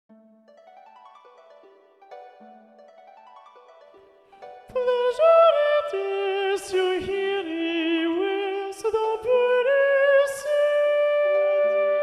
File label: spring carol ssa soprano 1 predominant